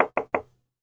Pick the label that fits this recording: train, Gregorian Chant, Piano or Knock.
Knock